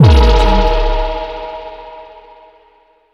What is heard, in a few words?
impact